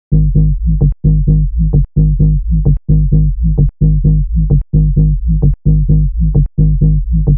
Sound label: Bass Loop 130 G, Techno; Ghosthack; Free Techno Sounds 2024